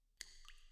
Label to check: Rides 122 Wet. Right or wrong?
Wrong